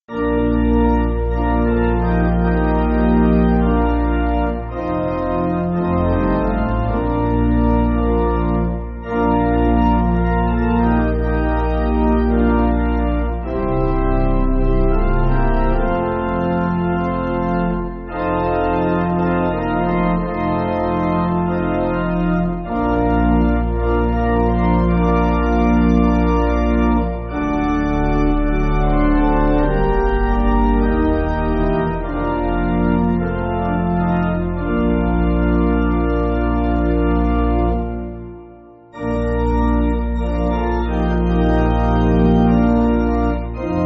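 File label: Pipe Organ, Music Backing; Hide Your Grief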